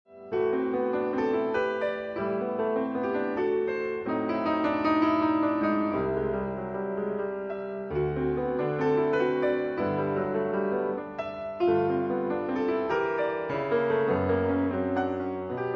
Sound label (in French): berceuse, piano 4 mains